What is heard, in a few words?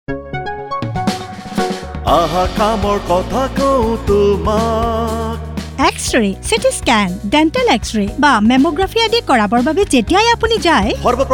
jingle assamese